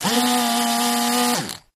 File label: Motor, Small; Vibrating With Buzz Thinner, Start Run Stop